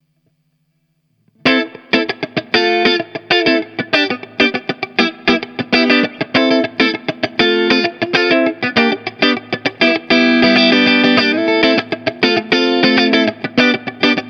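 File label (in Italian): ritmica, guitar effect; Dynaros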